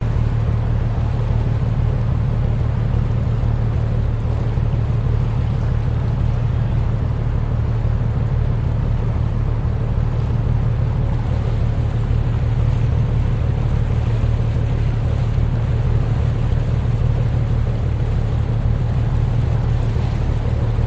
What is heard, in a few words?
Boat Engine Boatride On Donau Water Splashes; Budapest Hungary